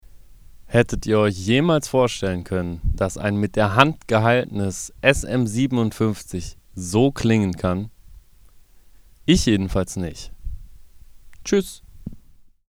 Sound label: Vox